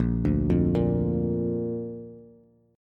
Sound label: Bb Major, Bass; arpeggiated; JGuitar